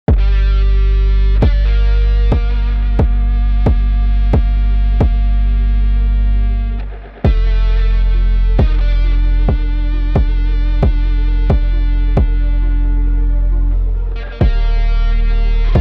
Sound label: Trap beat